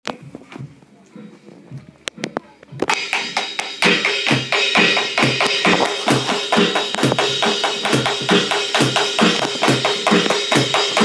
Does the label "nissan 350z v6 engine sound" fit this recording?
No